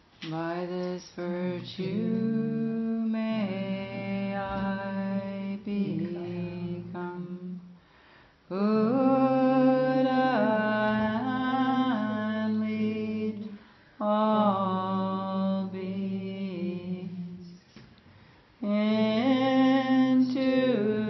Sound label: Dedication Chant